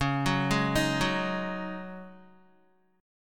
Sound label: Db Suspended 2nd Suspended 4th Db Guitar; arpeggiated; 9,9,6,8,6,x; 4E,4A,5D,5G,5C,6F; Dbsus2sus4